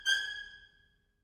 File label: Psycho String Stab Single; soundscrate